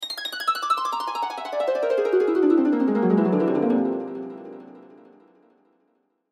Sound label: Harp Descend, Harp Descend Mystical Fast; Strings; Blastwave